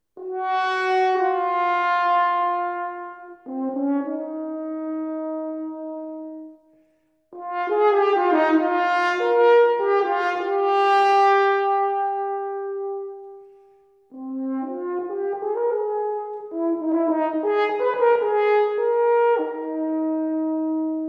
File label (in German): Laudatio fuer Horn Solo; Angerer